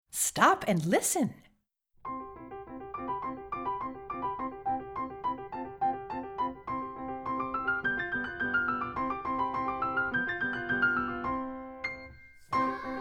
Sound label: Stop and listen piano